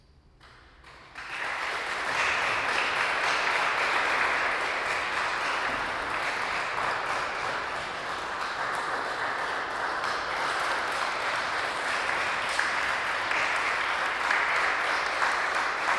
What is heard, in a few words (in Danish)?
Applaus